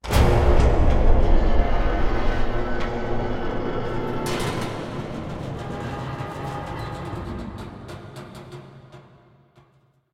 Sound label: Elevator Shudder; silent hill homecoming 2008 gamerip pc ps3 x360